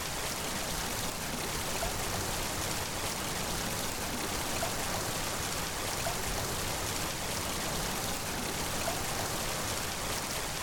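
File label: Object waterfall small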